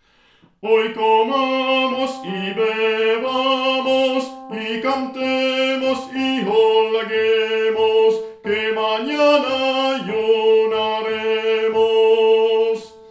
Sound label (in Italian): HOY COMAMOS Y BEBAMOS Tenori